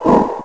ROCK

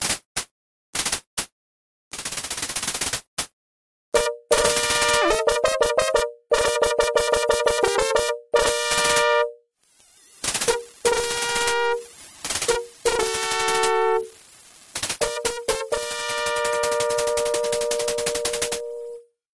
Fox Fanfare; The Tick; Genesis

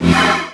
ROCK

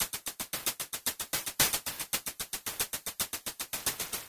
Game Over; Streets of Rage; Genesis

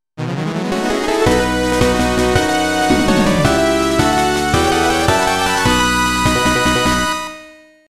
Fanfare; La Mulana OST

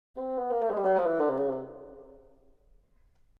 Bassoon Link; Ren & Stimpy

